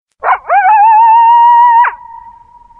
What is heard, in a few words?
coyote sound; animals